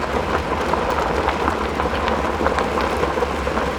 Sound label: Dirt Surface; Totaled; XBOX